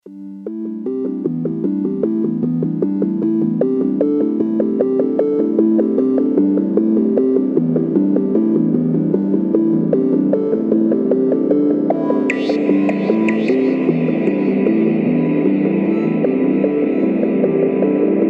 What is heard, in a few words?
Groove Psycadelic waves